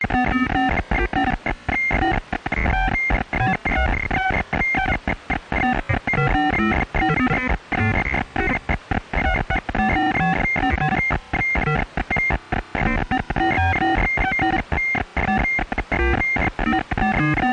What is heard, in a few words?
science fiction signal data radio interference vintage lo fi loop; Skyclad Sound